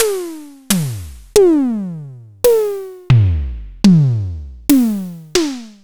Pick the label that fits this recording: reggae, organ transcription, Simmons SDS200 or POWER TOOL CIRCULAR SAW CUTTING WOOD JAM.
Simmons SDS200